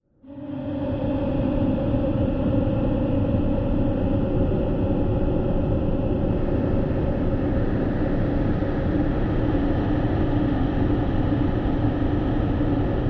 Drone Horror Texture Vocal Ambience Evil Wind Scary Dark; Airy; Scary Soundscape